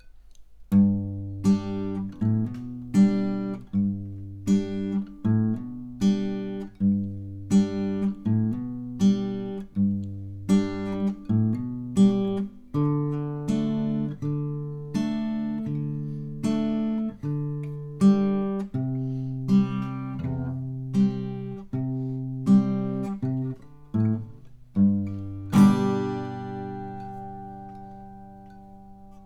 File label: Retro git; t.bone Retro